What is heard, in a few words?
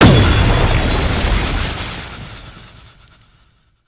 Knight Plunge Ability Shockwave; Warframe; Tau; Tau Dax; Abilities